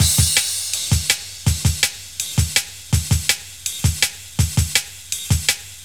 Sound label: Prog Break; Jungle; Breaks; Blu Mar Ten - Jungle Jungle - 1989 to 1999 Samplepack